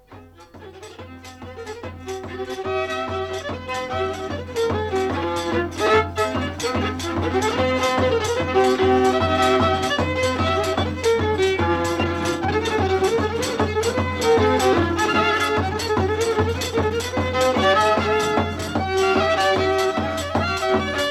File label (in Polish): polka